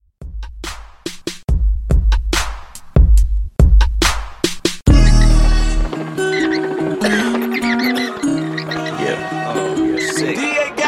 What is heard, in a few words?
Hip Hop RB Redrum Intro; Remixfreakz